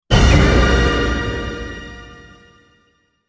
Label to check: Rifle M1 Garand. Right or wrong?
Wrong